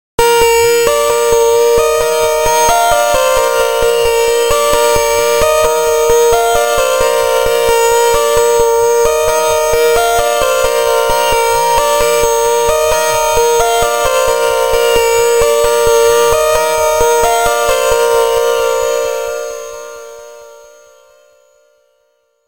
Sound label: relaxing alarm